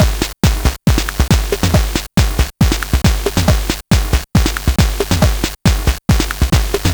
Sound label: LSDJ 4 to the dirty Floor 138BpM, Nintendo Gameboy Loops; maurice.rebell Loops